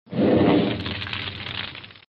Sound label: fire torch